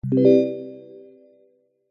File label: multimedia notification digital bell warm generic